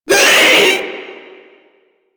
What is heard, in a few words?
Proto Infested vocal short attack